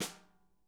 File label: SNARE; drum